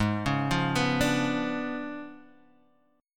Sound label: C sharp 7th Flat 9th, G sharp